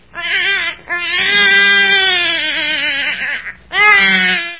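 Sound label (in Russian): плач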